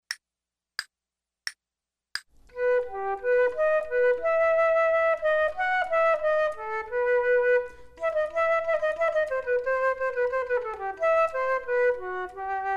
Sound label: flute; Selected Duets for Flute Podcast